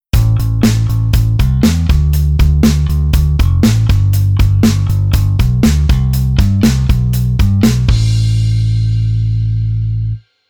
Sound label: Bass Oct